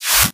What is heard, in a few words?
VFX Short Fills & Reverse